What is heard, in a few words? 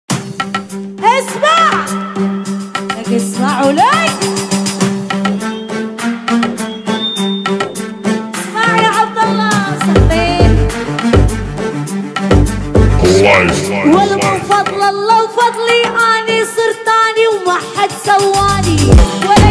arabic remix